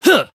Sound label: Voice attack; shigure